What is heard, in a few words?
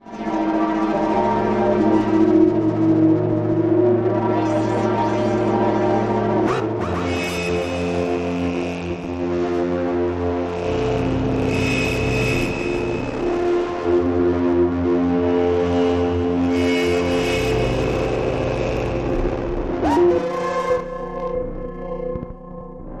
Distorted Reality Music Pad